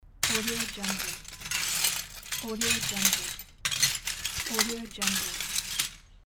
glass

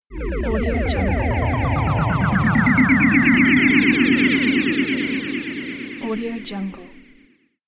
wave wobble

